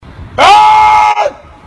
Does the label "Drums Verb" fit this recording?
No